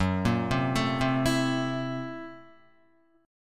F sharp 7th Sharp 9th Flat 5th F sharp down Guitar; arpeggiated; JGuitar